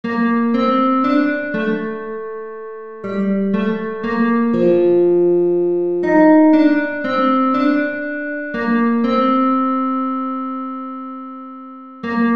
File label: guitar